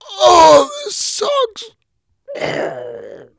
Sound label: PAIN HANG; Messiah; HARPOONED